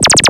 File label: Blaster Fire, cartoon Foley Fx